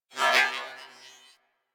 Beep Comby; Warframe; Ambience; Corpus; Beeps

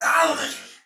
Death, Soldier Male; Sinecure

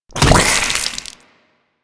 Ghoul Devourer Hook Tongue Spit; Warframe; Grineer Ghouls

